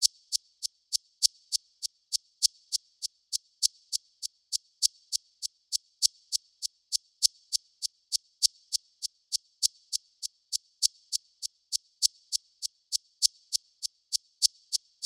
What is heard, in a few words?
Drum Loop 100 BPM Hihat; Cymatics; Vibes II Drum Loop Collection; Escalade Drum Loop